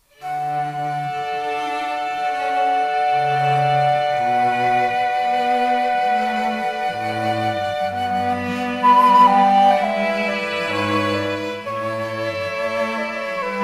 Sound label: Air Flute